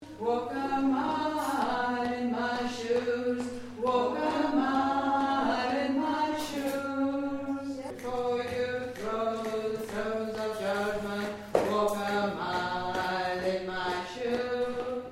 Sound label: alto